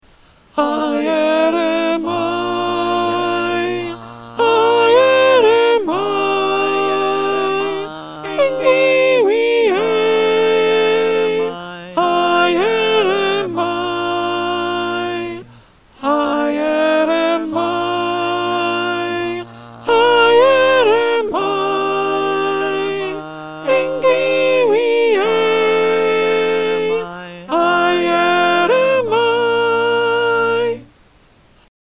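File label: Soprano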